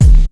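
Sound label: bass drum break heavy; 300 Drum Machines; Yamaha Motif ES-Series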